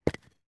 impact concrete slab drop on ground

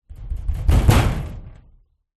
impact metal door fist rattle; zapsplat

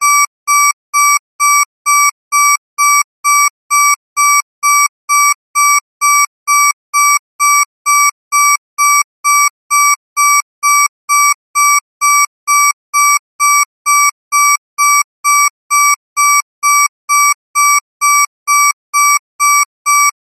emergency alarm siren small digital beeps medium

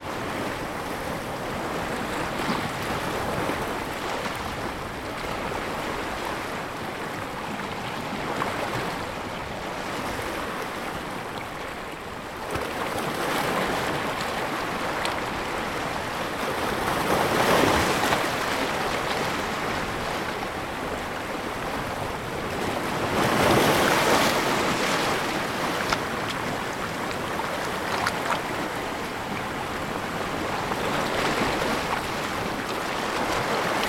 water ocean waves on rocks

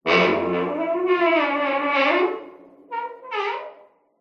horror door heavy metal dungeon creak squeak

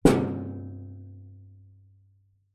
metal tool tray empty knock bash resonate; foley; zapsplat

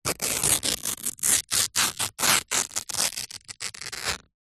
foley clothes running shorts polyester rip

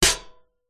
medieval sword impact metal shield vibrate

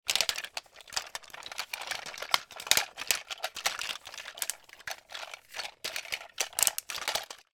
toy transformer robot plastic play with; sound effects one

